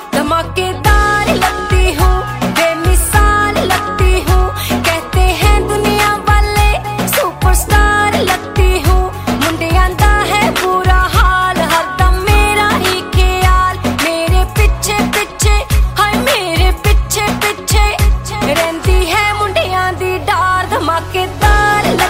New Romantic Hindi Female Song Ringtone

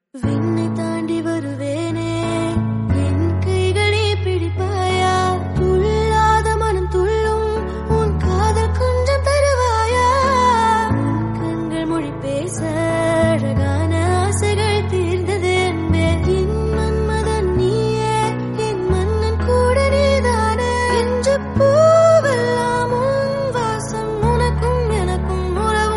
Song Ringtone Female Version; ringtones

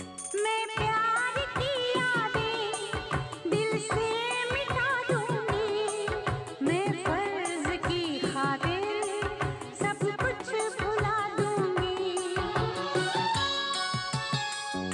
Sad Song Ringtone

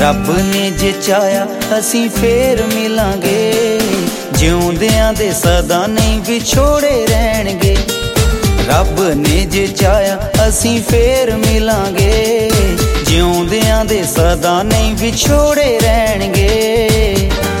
Punjabi Song Ringtone

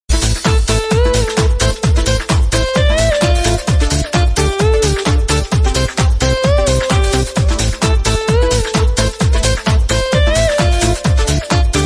Mobile Ringtone

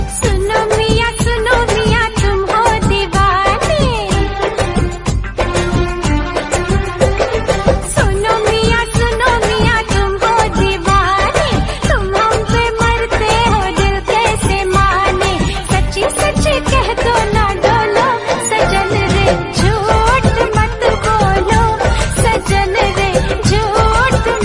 Bollywood Ringtone

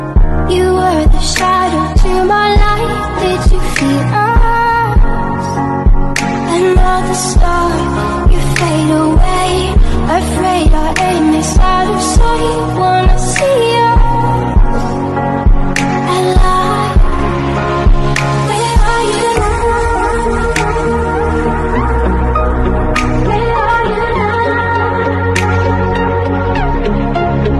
Romanti English Song Ringtone; ringtones

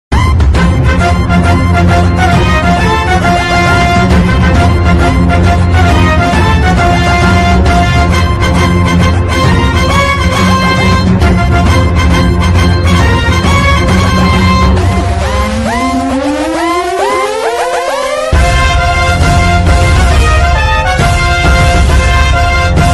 Theme Music Ringtone

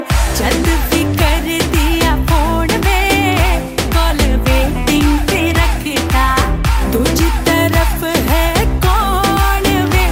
Call Waiting Tik Tok Song Ringtone

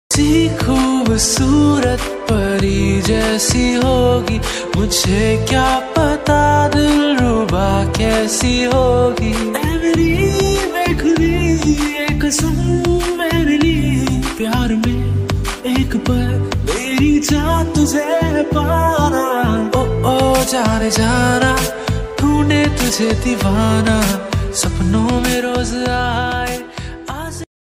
Hindi Gana Ringtone

